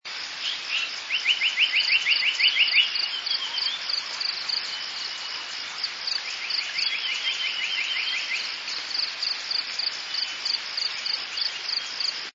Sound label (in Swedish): dag natur; borneo